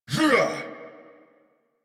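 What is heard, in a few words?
Ability Exert; Dialog; en; Warframe; Veilbreaker; Kahl Barks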